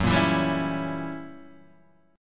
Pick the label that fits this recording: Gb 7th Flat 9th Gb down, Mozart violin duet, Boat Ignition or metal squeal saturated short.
Gb 7th Flat 9th Gb down